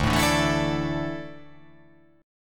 D Suspended 2nd D down

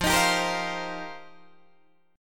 F 9th Suspended 4th F Guitar Open D, chord

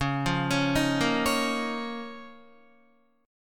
Db 6th Add 9th Db down Guitar; Db6add9; arpeggiated

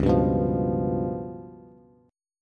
C sharp 7th Flat 5th, Bass; strummed; chord

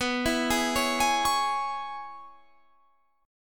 C sharp Minor B, guitar chord; C#m/B; arpeggiated; JGuitar

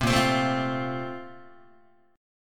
Bb Major 7th Suspended 2nd, Guitar; BbM7sus2 strummed; JGuitar

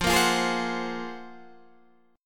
Gb Major Sharp 11th F

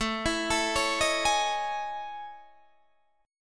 A Major Sharp 11th, Guitar, Standard; AM#11 arpeggiated

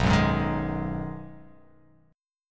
B 6th B down, chord

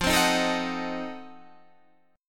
F 7th Flat 9th F down; F7b9; strummed

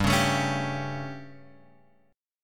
G Suspended 2nd Flat 5th G Guitar Open G

